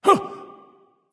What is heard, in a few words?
surprise; chronos